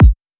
clean kick

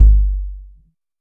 RESO KICK; Kick 1

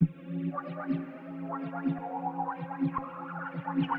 Science Synth, cinematic soundbeds; musicradar

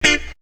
Guitar; AMG

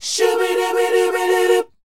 SHOO-B G#A, Vocal, R&B Dance; Spectrasonics Vocal Planet

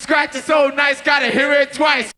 VOX; Zero G; Man Machine

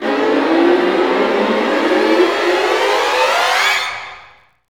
STRING GLISS; Roland - LA Composer vol. 1

